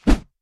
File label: heavy swing, weapons